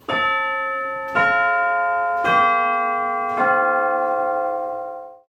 bell tower

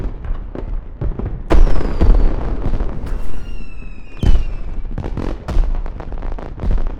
firework amb

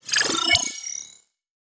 ui match cancel

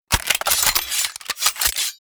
attack ready

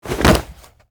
kneel single

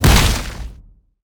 axe

